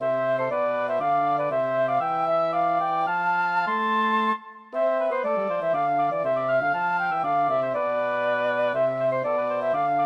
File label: for woodwind trio